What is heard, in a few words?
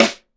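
SD, snare drum; 90 s Sample CDs; Sound & Vision - Gigapack I CD 1 (Roland); Real-Kit m 8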